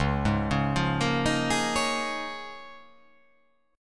C sharp 6th Add 9th C sharp down

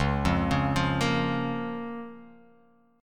C sharp 6th C sharp down

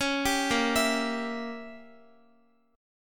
A sharp Diminished 7th Guitar, chord; A#dim7; arpeggiated; x,x,11,12,10,11

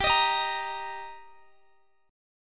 G Minor Sharp 5th G Guitar DADGAD, chord

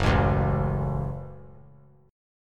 Gb Minor Gb; strummed; JGuitar

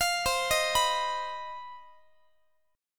C Minor Major 7th Double Flat 5th chord, Guitar; CmM7bb5; arpeggiated

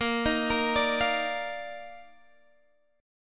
Eb Major 7th Suspended 2nd Bb

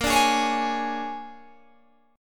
Bb 7th Sharp 9th Bb down Guitar Open G; strummed; Bb7#9

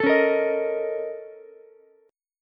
Bb Minor Major 11th Bb, Ukulele; BbmM11; strummed; JGuitar

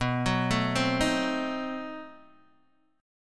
B 7th Flat 9th B Guitar Open D

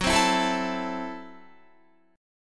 F sharp Minor 7th Flat 5th, Guitar; F#m7b5 strummed; 14,15,14,14,16,16; 4E,4A,5D,5G,5C,6F; chord shape

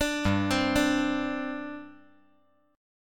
G Suspended 4th

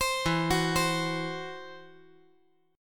E Suspended 2nd Sharp 5th; 17,16,14,15

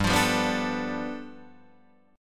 G 9th, Guitar Open D

